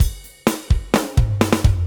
Fill Ins 128BPM; Vengeance Samples; VEH2 Loops

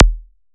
percussion; Vengeance Samples

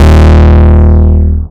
Root D#, Bass

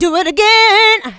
voc, female vox, Vocal House

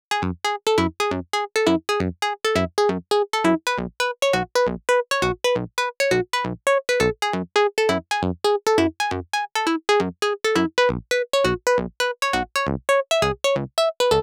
135BPM Sequence DRY, F# minor; Melody & Synth

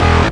Synth Bass; Vengeance Samples; VEE Synth Shots

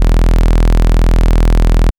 Bass Cuts; Instruments; VES1 Bass Cuts

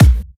Hard Kicks; Vengeance Samples

